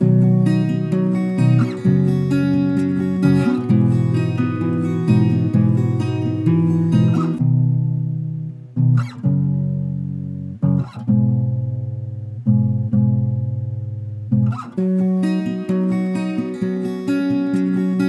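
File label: guitar 130 B Major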